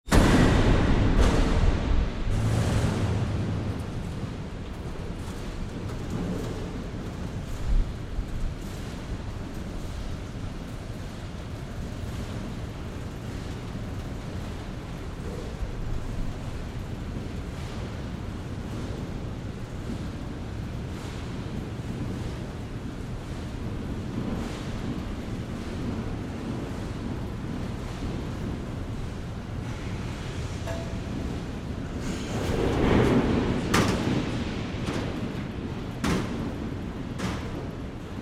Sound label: DOOR HANGAR